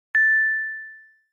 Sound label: Fold close; Nokia; 7390